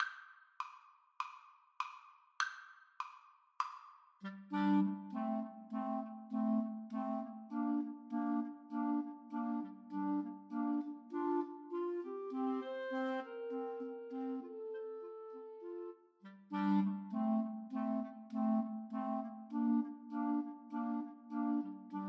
Clarinet trio

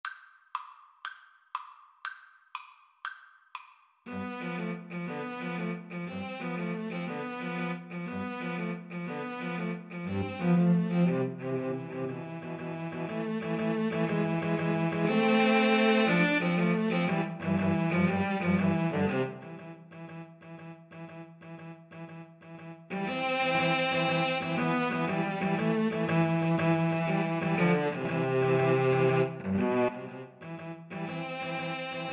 Cello trio

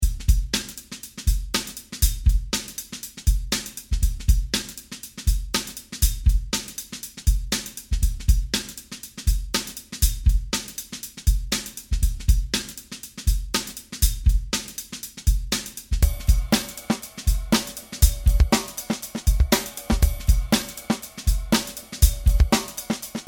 Bass Guitar

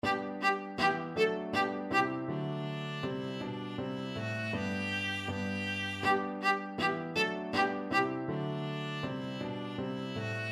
viola

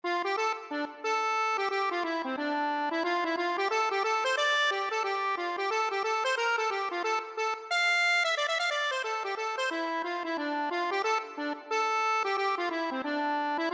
buttercups daisies, accordion

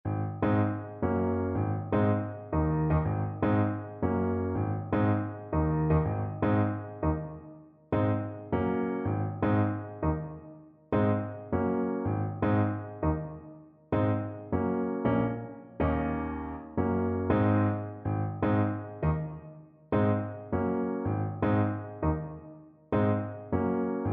Saxophone

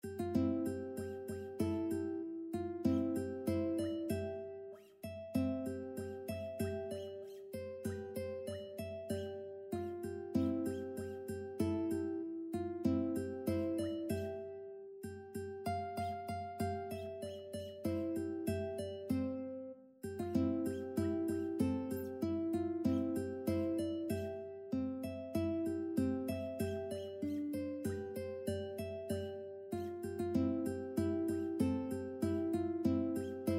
yellowrose UKE, ukulele

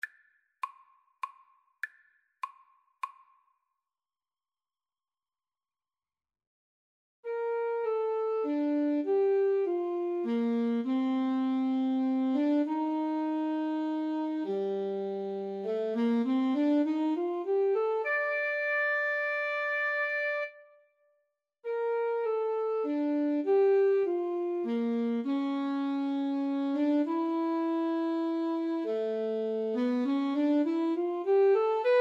saint saens swan 2 A SAX

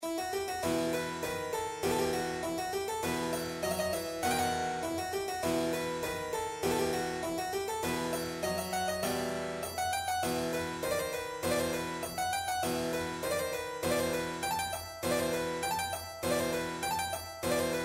tambourin suite in Em, piano

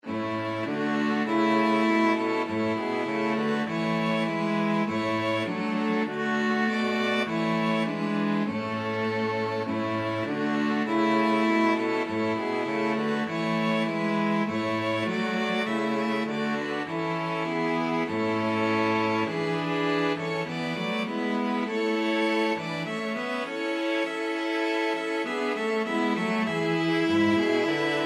string quartet; cwm rhondda

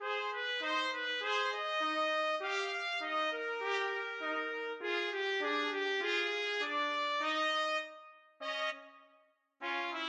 rag trumpet, trumpet duet

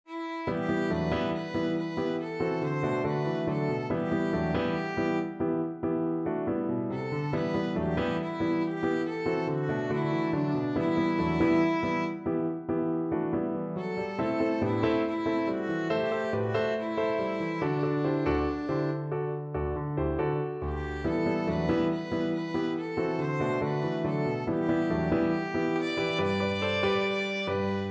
rock violin

